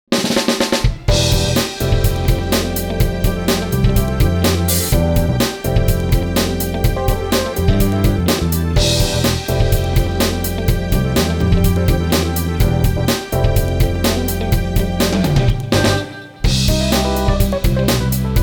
Blue Sky Fanfare

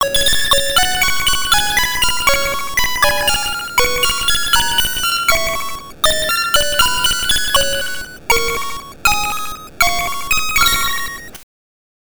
Tinkling; childs play; gbc gamerip 2002

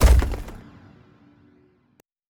Level Editor Object Place Stone

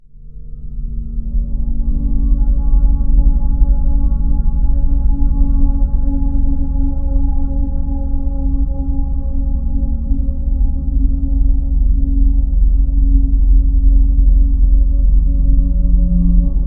environment graveyard stinger metallic

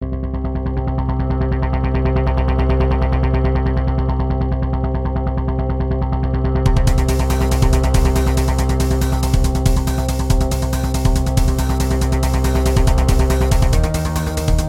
Suspense; Phoenix Wright Ace Attorney

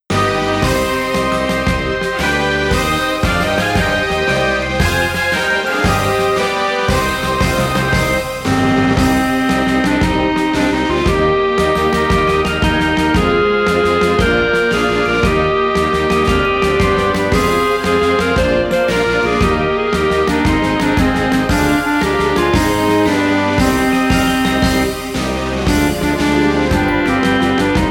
Anthem Instrumental; Haikyou High School

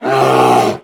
Bear Attack; Idle Champions of the Forgotten Realms

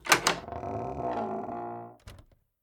Door squeak creak

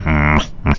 Pig Idle

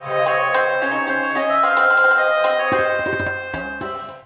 Egyptian Music Sting; Jazzpunk

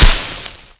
plant breaks; Angry Birds Space

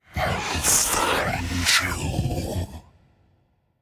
Hiding Spot; The Town; Easter Egg

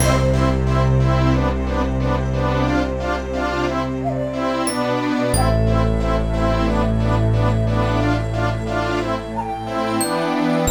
Jingle CASUAL; Super Scribblenauts 2010 NDS

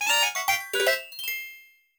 Fanfare; the legend of zelda spirit tracks

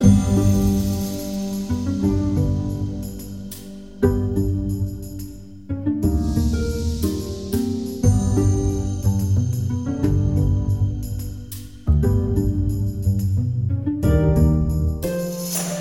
Climb to Woodburrow (Elemental Gate)

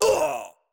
Hit; portal quest android; Antihero

